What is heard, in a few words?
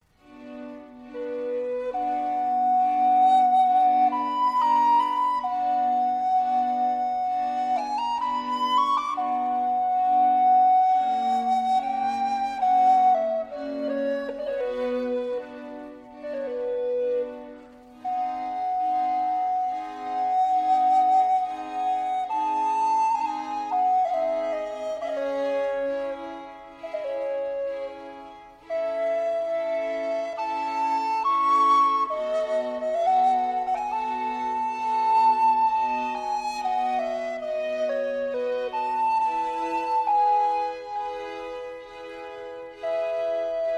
Flute Concerto in G Minor, Largo; Vivaldi; Op. 10 No. 2, RV 439, La notte; III. Largo